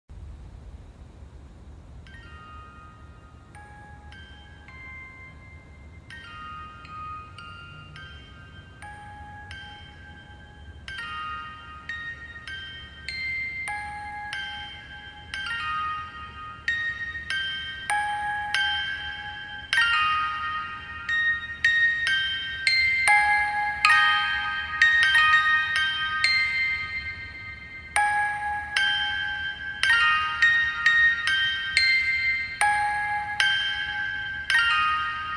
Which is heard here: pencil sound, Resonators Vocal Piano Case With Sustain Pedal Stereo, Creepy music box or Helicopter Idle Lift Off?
Creepy music box